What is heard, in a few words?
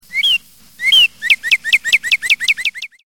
cardinal